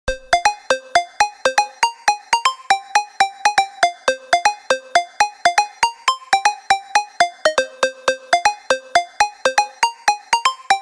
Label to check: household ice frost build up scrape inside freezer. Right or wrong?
Wrong